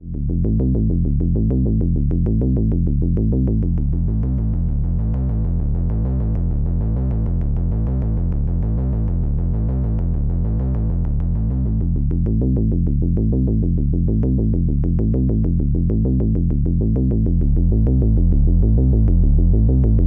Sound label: MiniNova Cream Thump